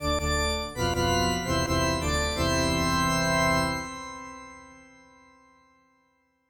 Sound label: Fanfare